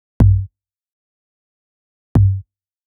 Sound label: Perc, EDM 123 bpm; Drop; Ableton Projects; Big EDM 123 bpm (Drop) Project